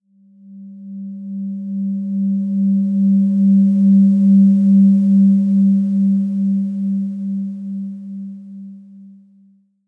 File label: Bowl 197Hz